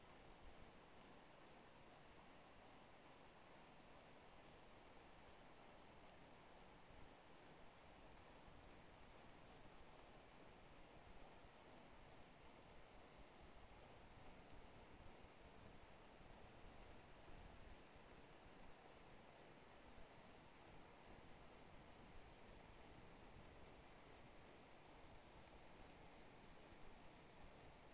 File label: HYDROPHONE 16000Hz; JASCO AMAR HYDROPHONE 740